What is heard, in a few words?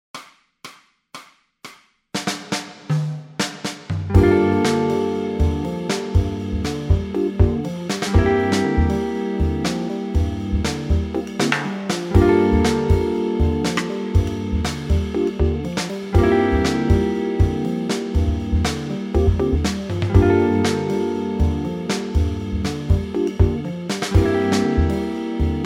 bass modes, backing tracks